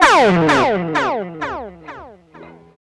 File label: Guitar, Trip-Hop Loops